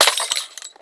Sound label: Sliced Hotsauce; boomerang fu